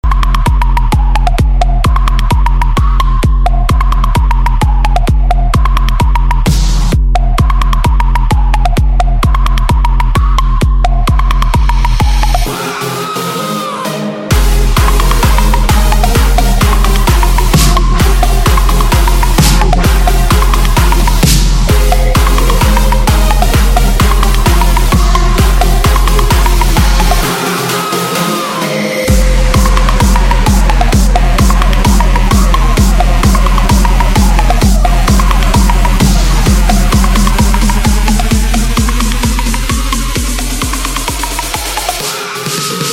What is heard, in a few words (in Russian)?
Звонки на Мобильный, Рингтоны